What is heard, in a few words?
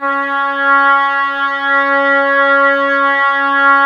OBOE C#4; Woodwinds; Sample CDs; Miroslav Vitous; Woodwind Brass Ensemble; Banks W&B